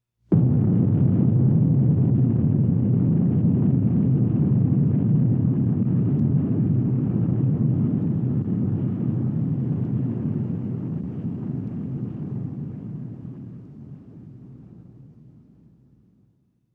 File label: Explosion